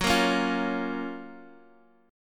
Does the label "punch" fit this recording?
No